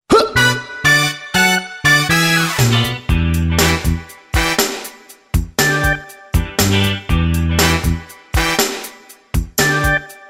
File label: Funky train